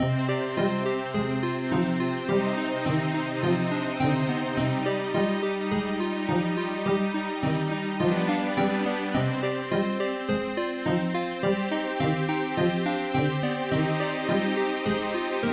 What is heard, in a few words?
8-bit classical; 8-bit lore